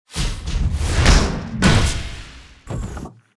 Badger Duble Swing; starbites

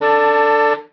Car - billygoat horn; Muscle Car 3: Illegal Street

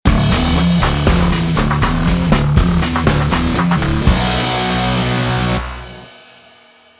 Music Lose; Wasteland Racers 2071

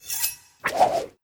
Throw; Skill Racaille

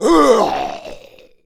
Male Die; Mars Sortie

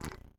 Timer Turn Off

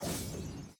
Ice Elemental; unsighted

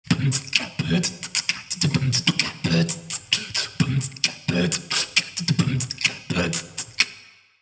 beatbox 16 beats

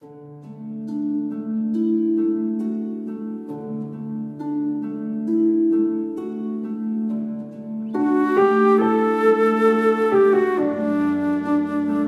Romance Emotion 68 4-4

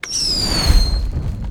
Fire Elem Inferno Fire

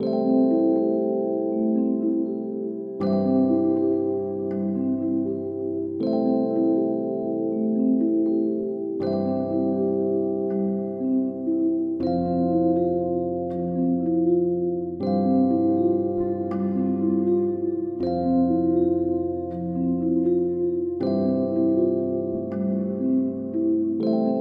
Keys 80bpm; Botanist Hub; Viewfinder

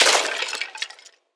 Skeleton Death

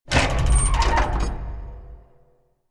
Object Dungeon Iron Gate Open; starbites

